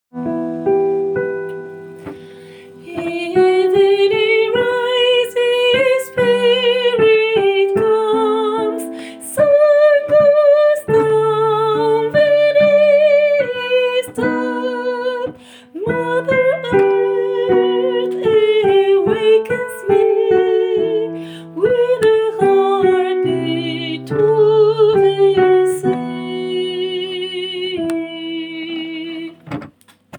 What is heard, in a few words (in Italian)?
SOPRANI; evening rise